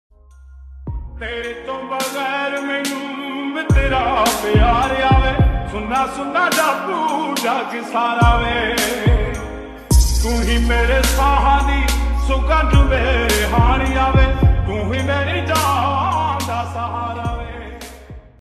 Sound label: Slowed Reverb Ringtone